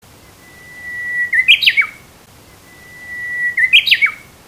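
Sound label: uguisu good, bush warbler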